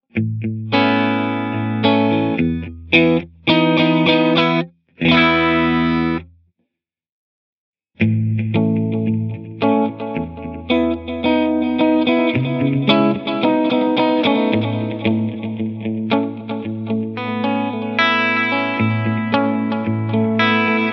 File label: Delay Reverb Hall